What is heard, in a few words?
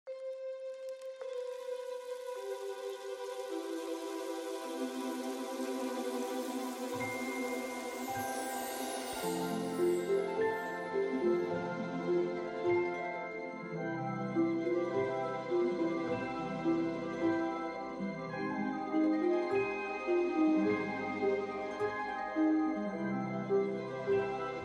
Orchestral Arrangement; Donkey Kong 64